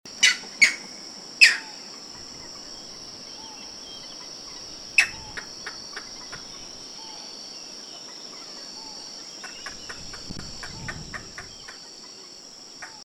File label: green heron call; chesapeakebay